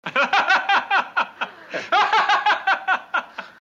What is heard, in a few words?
laughter